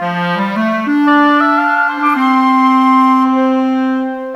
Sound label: Winds, Orchestral, Rock Pop; Sonic Foundry (Sony Creative Software); Orchestral Series VOL-4; Rock and Pop; Rock-Pop 08